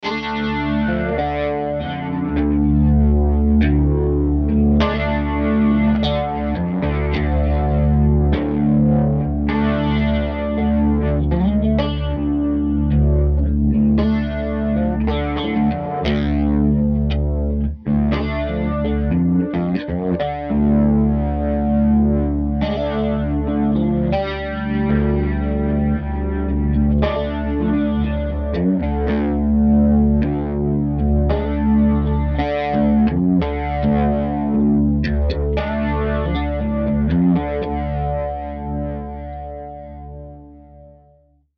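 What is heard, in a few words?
Musical Element, Drum Snare March; Blastwave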